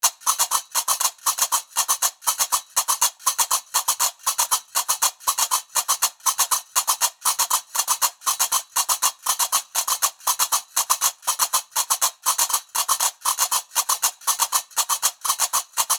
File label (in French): loop binaire tempo 120; qaraqab